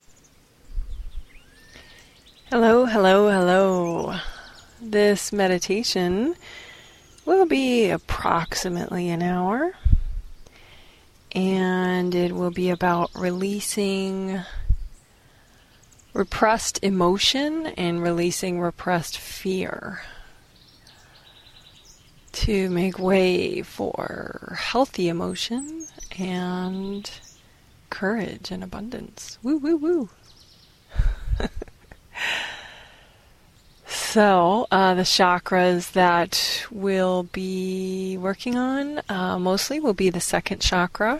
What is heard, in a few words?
birds; repressed emotion fear 1hr